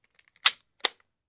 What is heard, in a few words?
Gun cocking sound